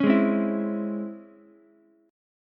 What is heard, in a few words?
Db Minor Major 7th Ukulele, DbmM7 chord; DbmM7 strummed; JGuitar